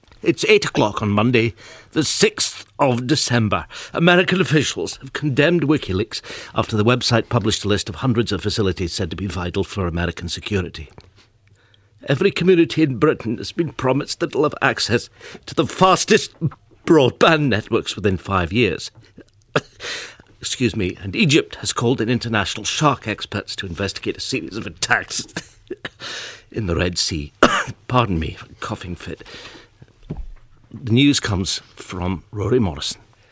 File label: unable to stifle coughing laughing fit; jim naughtie